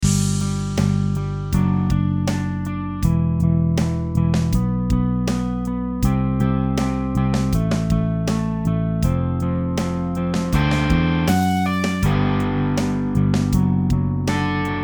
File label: A melo Guitar